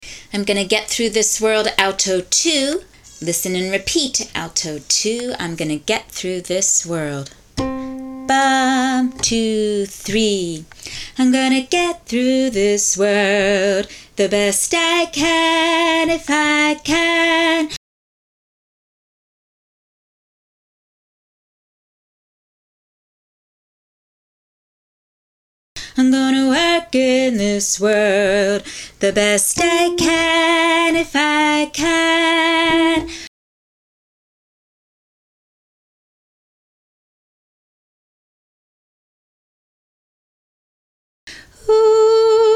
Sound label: Gonna Get Alto 2 drill, chorus; Listen and repeat